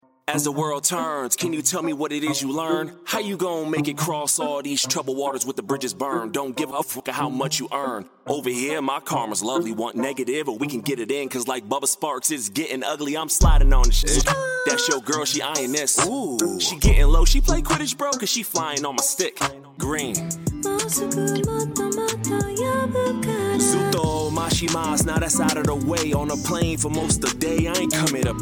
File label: Rap Demo